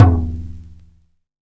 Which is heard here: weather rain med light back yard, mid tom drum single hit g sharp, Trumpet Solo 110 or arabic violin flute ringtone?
mid tom drum single hit g sharp